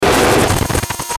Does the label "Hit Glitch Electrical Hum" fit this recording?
Yes